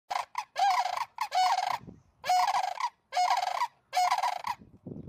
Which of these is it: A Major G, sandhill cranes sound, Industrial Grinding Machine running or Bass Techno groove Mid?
sandhill cranes sound